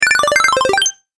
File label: Win Bonus Points, video game sound effects